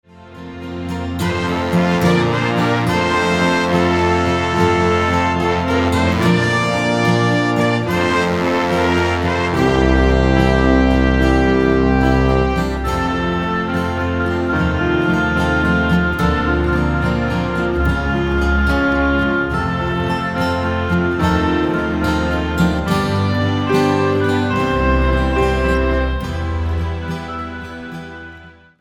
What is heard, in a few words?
Gloria instrumental; Glory To God